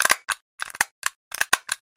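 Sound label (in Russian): тройное неторопливое скрепление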